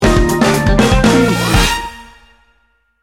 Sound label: happy feet sting bumper